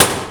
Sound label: snare